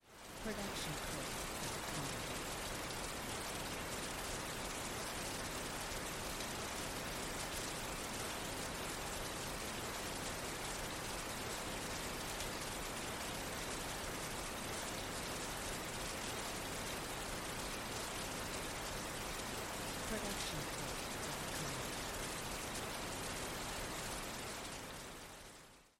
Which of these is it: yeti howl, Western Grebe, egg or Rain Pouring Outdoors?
Rain Pouring Outdoors